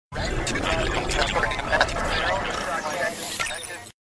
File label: Hacking